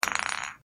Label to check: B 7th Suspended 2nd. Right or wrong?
Wrong